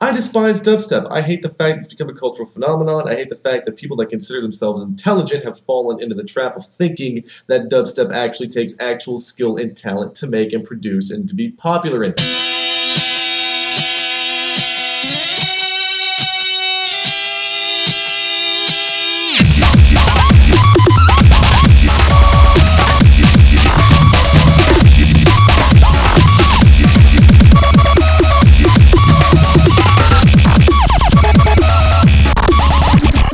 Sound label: Epic Dubstep Mix